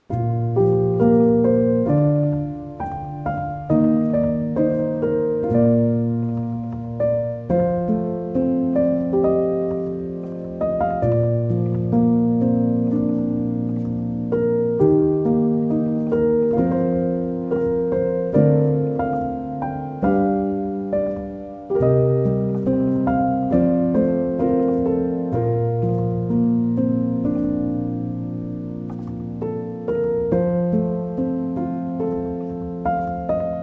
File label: Piano Version; The Tale of The Princess Kaguya